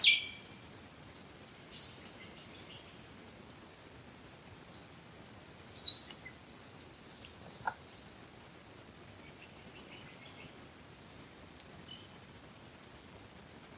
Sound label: Rainforest